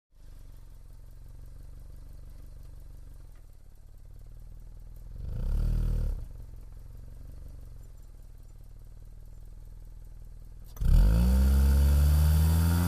Sports Car, 4-cylinder; Idles, Then Fast Acceleration Through Gears ( Stick Shift ) To High Speed. Slows To Medium Speed Steady; 1986